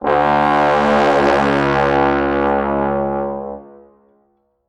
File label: danger short; Grim Tales: The Legacy